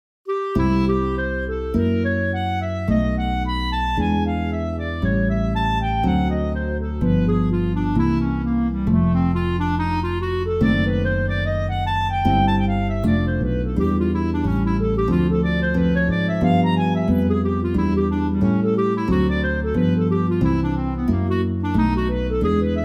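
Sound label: INTRO clarinet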